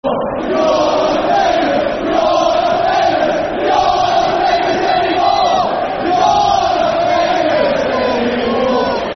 You're Not Famous Anymore, Football Chant (Soccer Songs); fanchants; Huddersfield Town Htfc